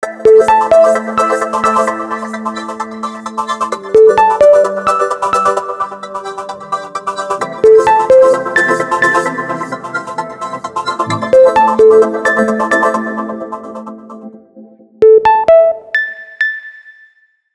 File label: House music; Realme 8; ringtones